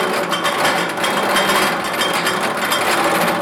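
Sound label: hyper rc lift, roller coaster; cp magnum xl 200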